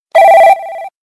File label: Windows Mobile